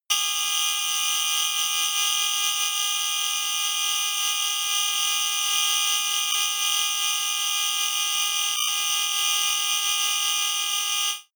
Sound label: glitch electronics long, sciene fiction